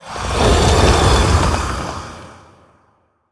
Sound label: Arcane Watcher Attack